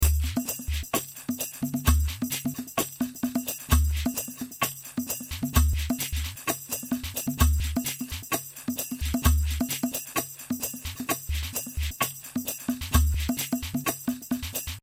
full loop

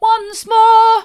once more hard, vocal

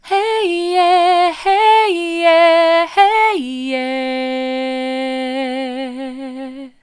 hey soft, vocal

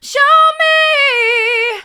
hard, vocal; show me

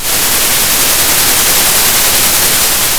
pop, Sound of a thunderstorm

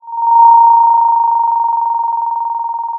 Sound of a phone ringing

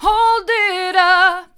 hold it up hard, vocal

